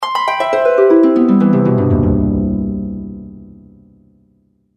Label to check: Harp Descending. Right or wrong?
Right